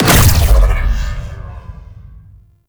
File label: Rail Jack Weapon; Warframe; RailJack Weapons; Modular Weapons